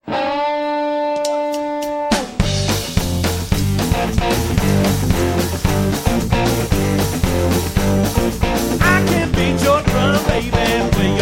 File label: Drum